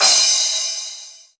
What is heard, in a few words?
Crash, Cymbals; Vengeance Samples